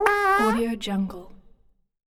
the fart

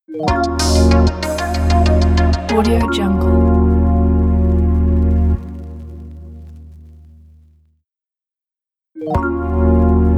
joyful logo

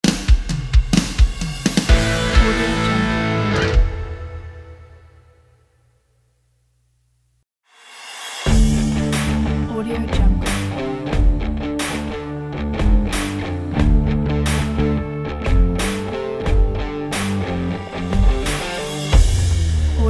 upbeat intense rock logo pack

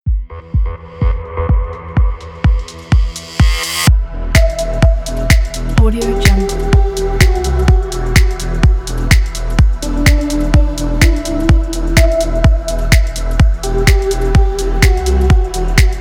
fashion dance song; makershaker

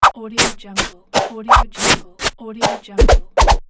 whoosh pack

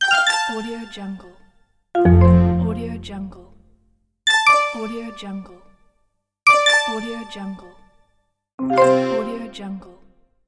menu

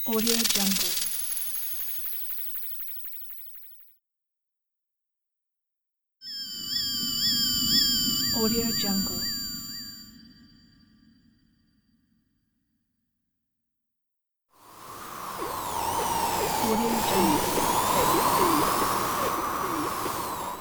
dark scifi transition pack

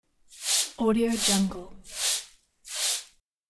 broom

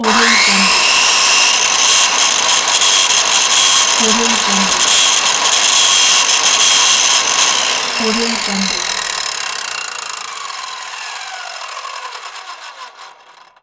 drill

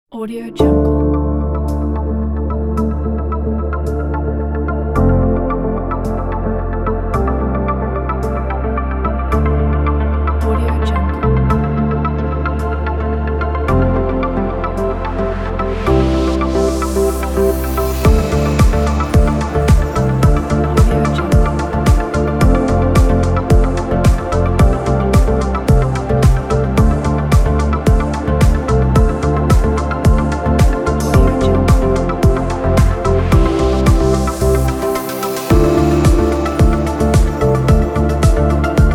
tech background pack

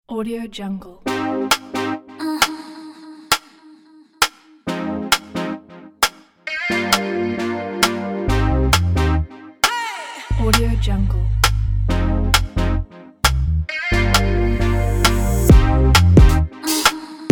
modern advertising

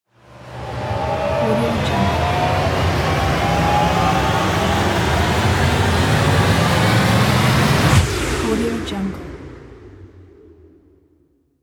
teleportation

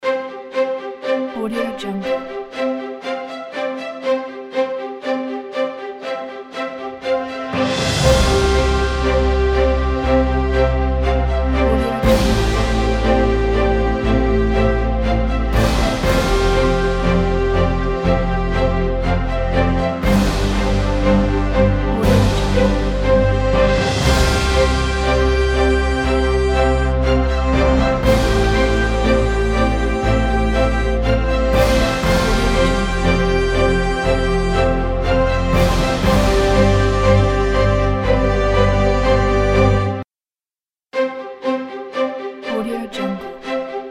uplifting emotional orchestral background loop

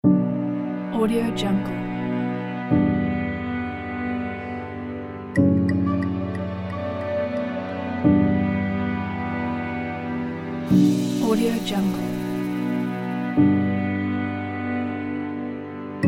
heartfelt africa

